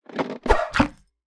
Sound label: longbow 15ft; ranged weapons; psfx